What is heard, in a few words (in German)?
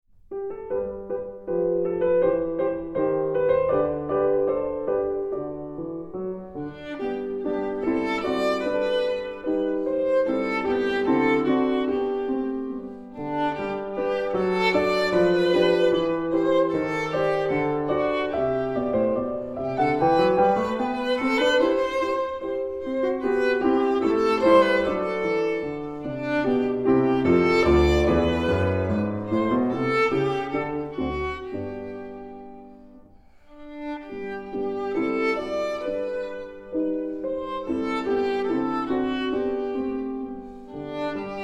Karaoke; Der Winter ist vergangen; Vokalausbildung